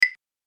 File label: notification